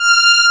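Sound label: analog strings F5, analog synth; SAMPLE WAVE MIXING 1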